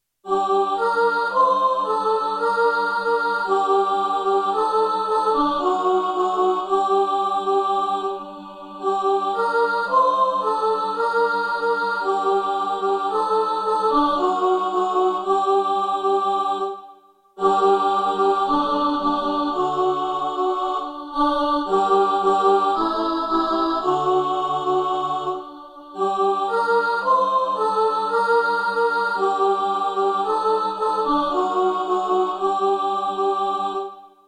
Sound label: alto